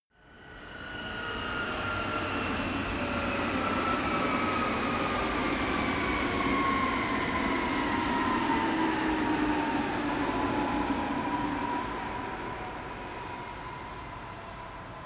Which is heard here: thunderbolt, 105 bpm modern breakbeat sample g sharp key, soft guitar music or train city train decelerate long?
train city train decelerate long